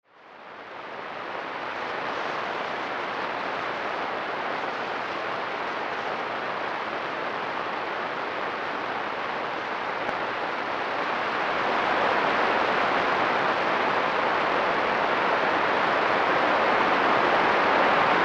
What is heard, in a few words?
20.21MHz; Ashcraft